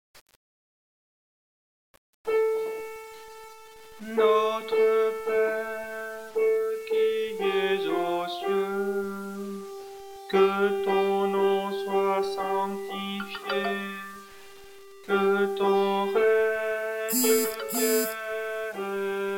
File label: soprano